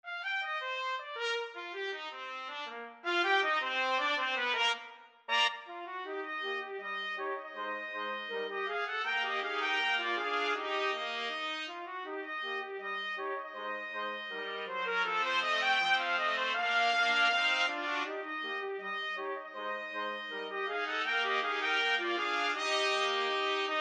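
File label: 4 trumpets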